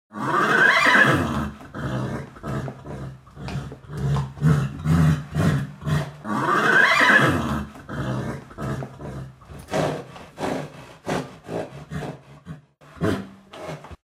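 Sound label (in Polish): odgłos zwierząt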